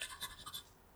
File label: crayon scribble, writing scribble noises on paper